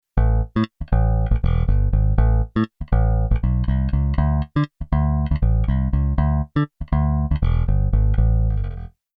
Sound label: bass funk bass